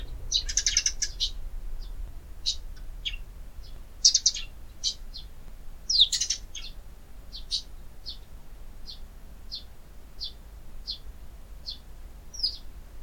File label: sparrows